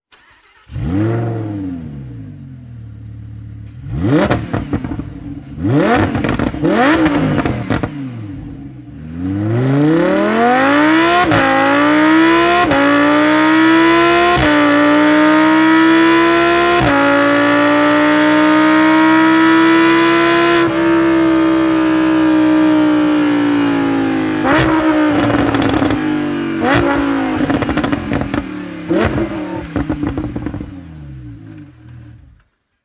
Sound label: Slip On Lamborghini Huracan LP 610 4